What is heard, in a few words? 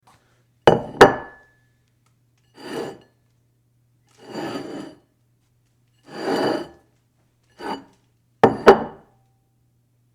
dish sliding on table